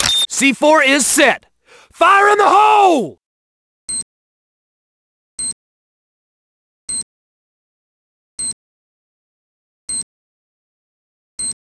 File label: c4 remote; secondary